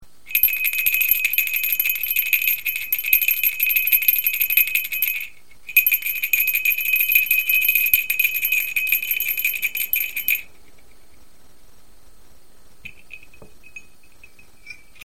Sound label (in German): sternblumenschelle